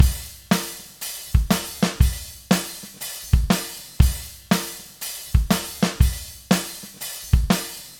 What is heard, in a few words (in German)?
drums ohne gate; softube valley people dyna-mite